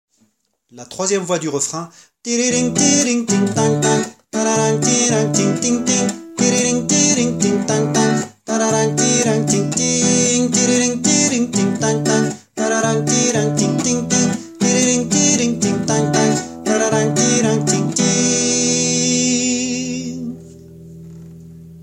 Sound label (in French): voix